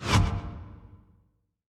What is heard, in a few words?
erudite shot